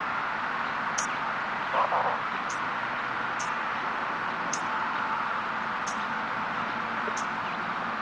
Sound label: bird